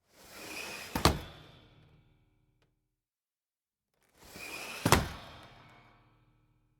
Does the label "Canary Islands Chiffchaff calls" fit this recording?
No